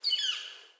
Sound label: Eagle, Garden